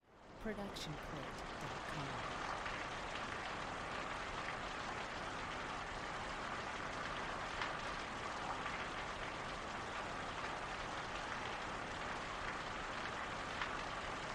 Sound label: Rain Pouring Indoors; soundscrate